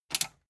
metal button tiny click enable fast

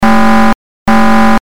warning signal

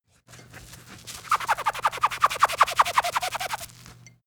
sponge squeak fast

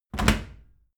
washing machine tumble drier door close

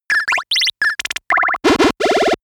arcade shooting play

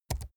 keyboard laptop key press fast soft

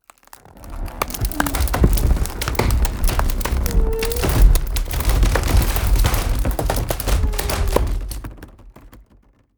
wood medium pressured

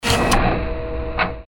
door lock

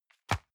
envelope drop medium

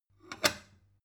stone bowl shelve short

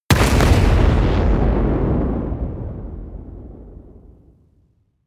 missile large explode close

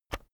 keyboard external enter push heavy

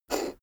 poker chip flick slide table

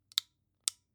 flashlight off slow

